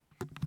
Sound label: Pop Or Plop